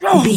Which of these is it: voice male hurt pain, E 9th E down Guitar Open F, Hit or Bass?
voice male hurt pain